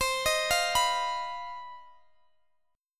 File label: C Minor Major 7th Double Flat 5th chord, Guitar Open D